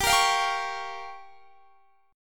G Minor 7th Flat 5th G

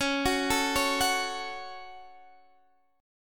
Db Suspended 4th Sharp 5th Db Guitar Standard; x,16,16,14,14,14; arpeggiated

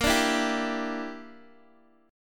A sharp 6th Flat 5th, Guitar DADGAD

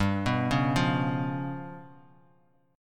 G 7th Flat 5th down Bass Standard; arpeggiated; JGuitar

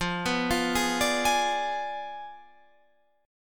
F Diminished 7th F down Guitar Standard; Fdim7 arpeggiated; JGuitar

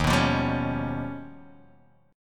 Eb Augmented 9th Eb down Guitar DADGAD

